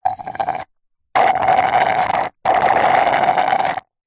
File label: food drink milkshake thick suck dregs straw slurp